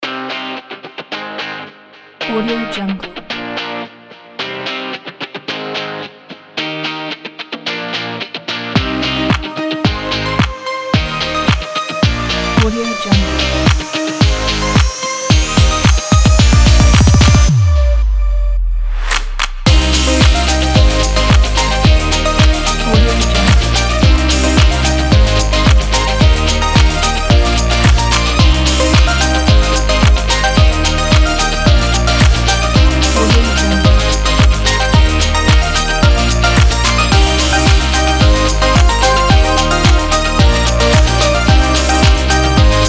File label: upbeat pop happy kit